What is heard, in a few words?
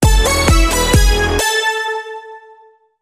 Crowd Control Sting